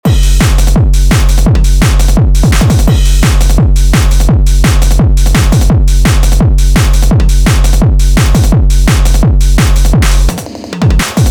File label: HardCore; drum; beat; Drum Blaster: The Ultimate Beat Generator, Sampler Plugin for Modern Producers